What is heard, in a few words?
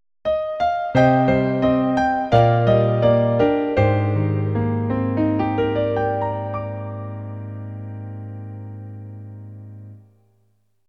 Lose; Little Savior